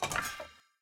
stir finish

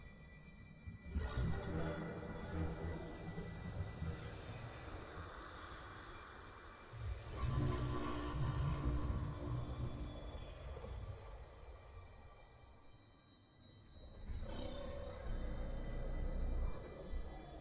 amb peak; targon

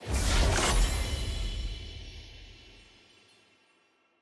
sfx loot reveal material rare

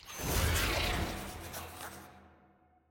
ui warning appear

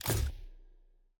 loot reroll button click

